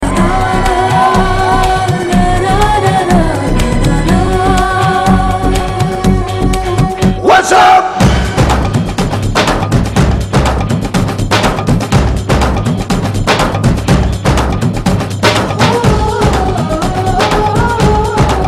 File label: Gust